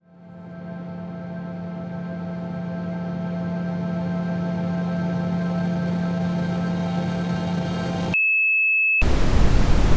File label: jingle